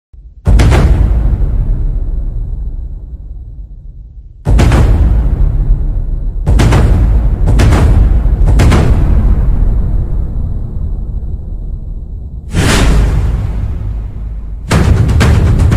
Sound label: Intro Drums; Galactic Core: The Lost Fleet VR